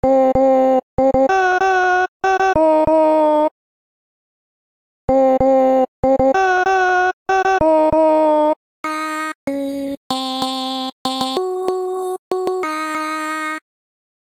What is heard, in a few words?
Vocals; Cake Race Legacy